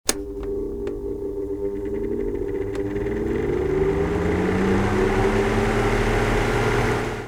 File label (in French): Ventilo demarage; bruitage; Electro-menager